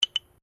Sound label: Clap Sticks (Bilma) Small Double, Traditional Australian Aborigine rhythm sticks; Miscellaneous Musical